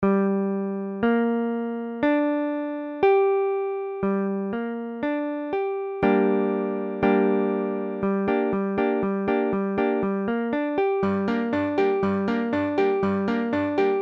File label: guitare, Gm